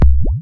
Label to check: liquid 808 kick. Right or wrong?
Right